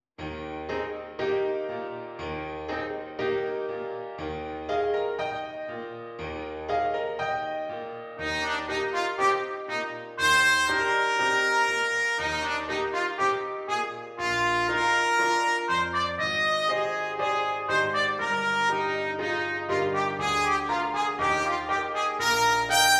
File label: Trumpet; flugel cornet